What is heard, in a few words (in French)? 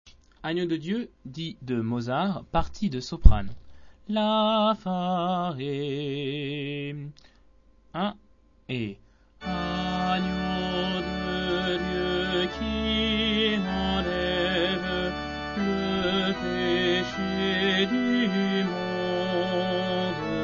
Agneau de dieu sopranes; Mozart